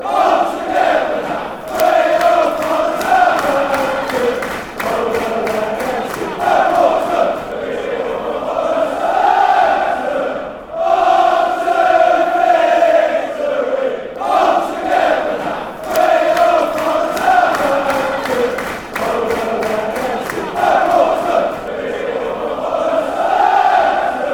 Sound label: we all follow southampton fanchants